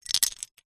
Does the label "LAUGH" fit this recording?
No